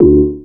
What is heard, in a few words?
Anasound Short mid D; Sonic Foundry (Sony Creative Software)